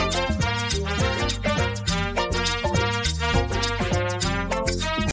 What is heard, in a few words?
mambo but from jamaica